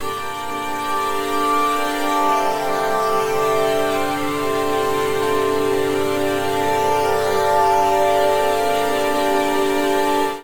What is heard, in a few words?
Nostalgia Strings D Minor 92 bpm; Accompaniment; Tonal; MIDI Clips; Drive and Glow; Ableton; Factory Packs